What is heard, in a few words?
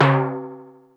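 Timbale Low, Yamaha DD-35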